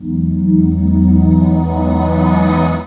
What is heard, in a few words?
Revelation Pad - 1B; Jungle; Pads; Blu Mar Ten; Jungle - 1989 to 1999